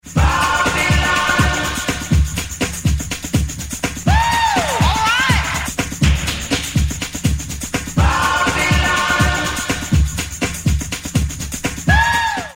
Beat

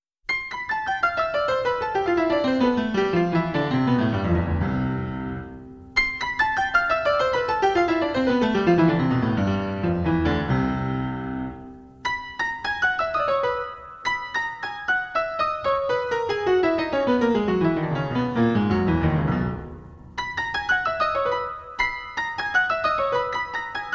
Piano Practice

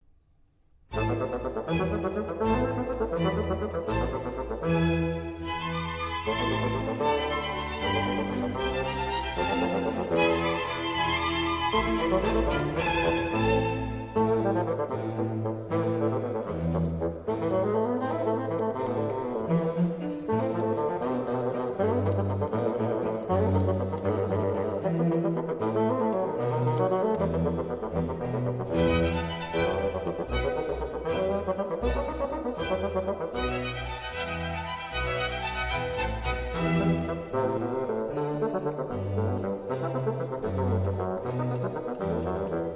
Bassoon Concerto in A minor, Allegro; Vivaldi

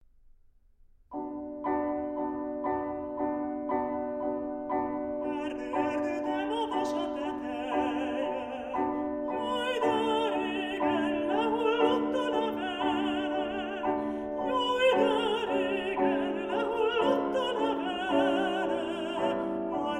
New Hungarian Folk Song (With voice), Piano; Bartók; Mikrokosmos, Sz. 107 - Book 5 No.127

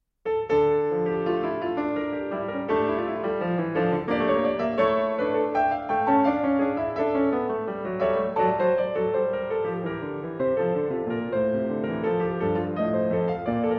French Suite No.1 in D Minor, Courante; BWV 812; Vladimir Ashkenazy; Bach French Suites, BWV 812-817

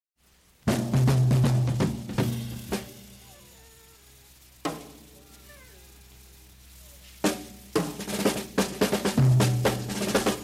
Instrumental Jam

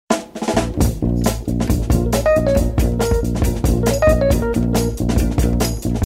Beat and bass

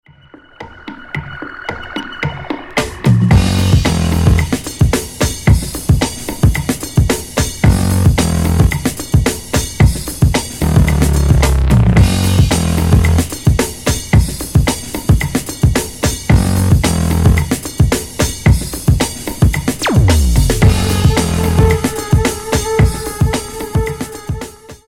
Beat

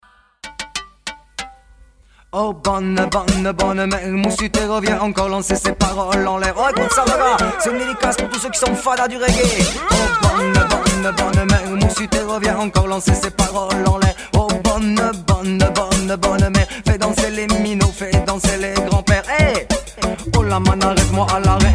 Reggae

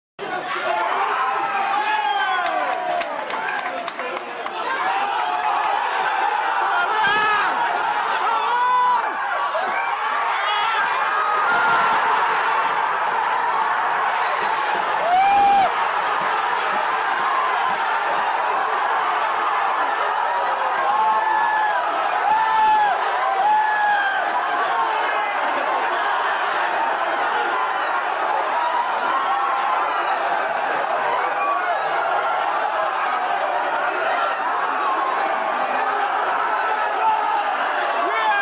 Crowd Chorus; Chelsea F.c.; Chelsea community

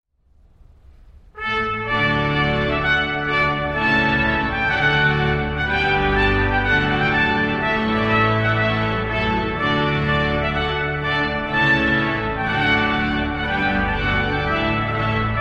Te deum in D Major, Prélude, Trumpet and Organ; Tchaikovsky

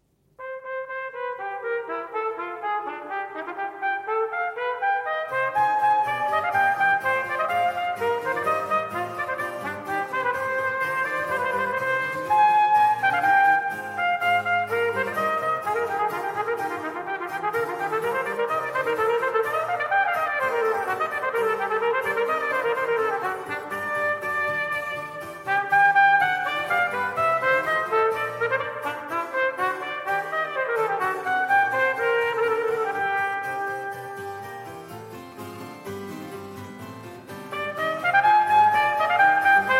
Oboe Sonata in C minor, Arr. Trumpet, Allegro; Op.1, No.8, HWV 366; 2. Allegro

